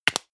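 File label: deodorant can lid close hard, badezimmer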